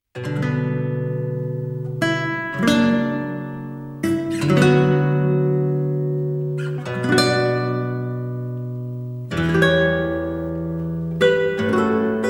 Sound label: Nylon Guitar